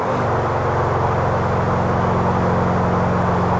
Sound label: third cruise, vehicles; premier